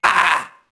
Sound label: mobster get hit; enemy; Max Payne